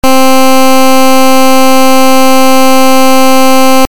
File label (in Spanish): pulso